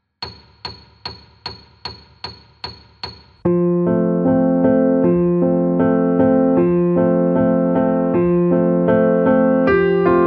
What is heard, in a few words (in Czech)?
150 metronom, klavír